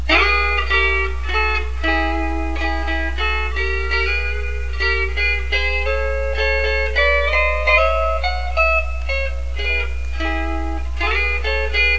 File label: thickneck